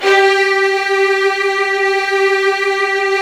Virtuoso Strings; ILIO; Kirk Hunter Virtuoso Strings VOL-1

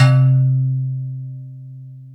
POTSTICK, BELLS; Back In Time Records; Big Bang

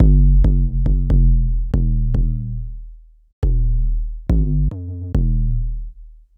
Sine Bass; Sonic Foundry (Sony Creative Software); Knutrix Drum & Bass

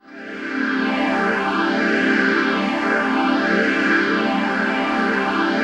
PHASE PAD

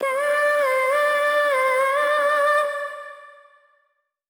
Soul Vocals Cm (Wet) 140 BPM, Melodies; 99Sounds x Monosounds

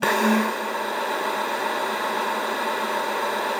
luft, alto sax; Xsample VOL-2

